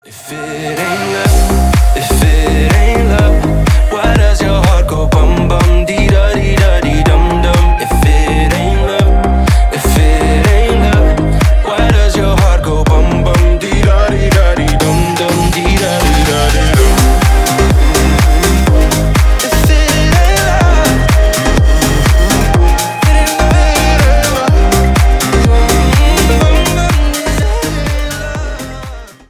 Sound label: 4 strings